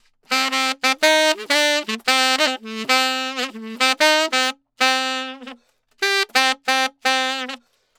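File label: 120 Trad Jazz Sax C major; Brass; Digidesign; Pro Tools; Pro Tools Essential Loops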